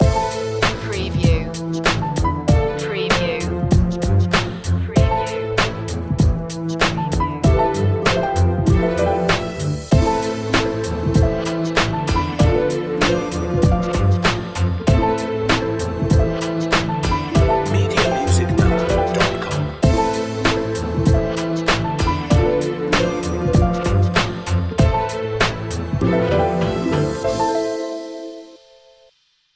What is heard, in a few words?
harp hop